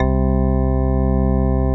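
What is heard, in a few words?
METAL ORGAN; Greytsounds; Scott Peers Sound Engineering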